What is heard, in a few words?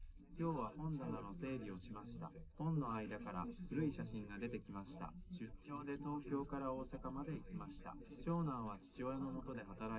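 male, live recording 130ms 1m; SiSEC11; underdetermined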